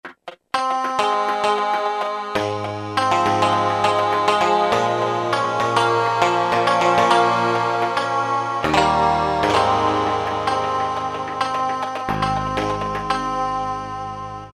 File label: PL MutGtr, Yamaha TG77; Factory Presets